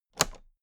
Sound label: toolbox latch close heavy